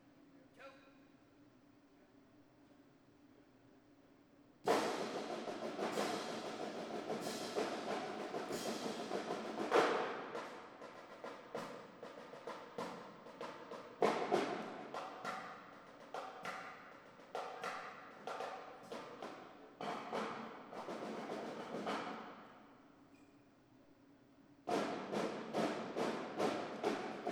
Drum Solo Marching; ensemble run through; lynn ma

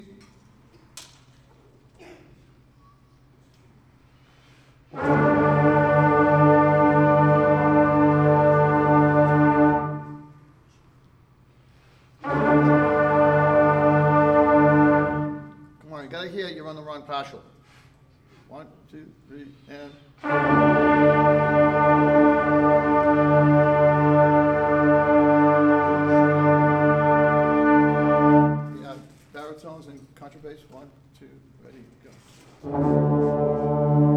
Warm Up Low G Progression, brass

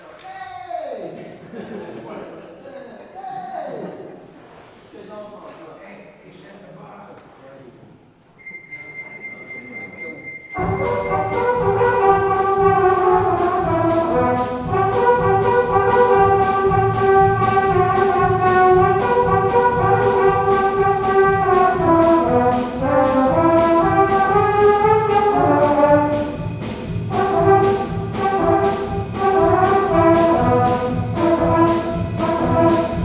Mellos; north star brass; woburn ma

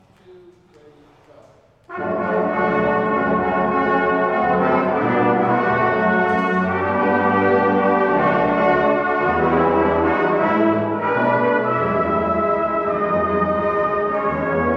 Jingle Bells on Parade, brass; north star brass practice holiday music

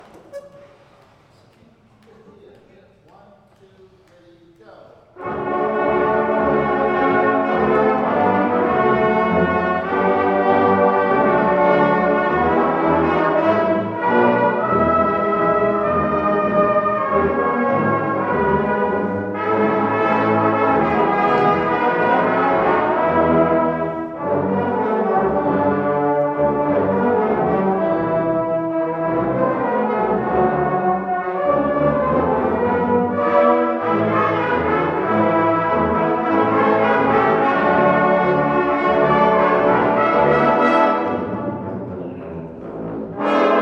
Jingle Bells on Parade, brass; north star brass practice holiday music

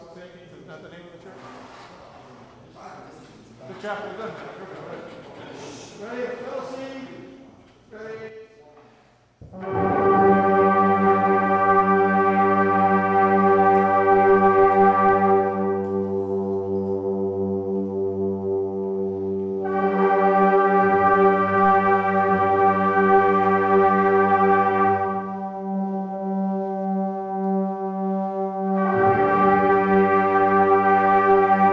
Warmup, brass practice; woburn ma

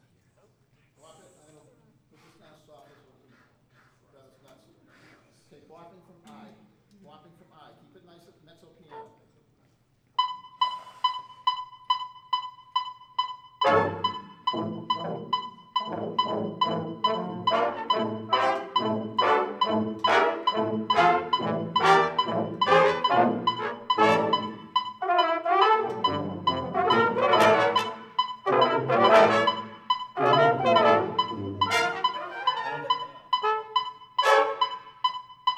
Bopping, brass practice

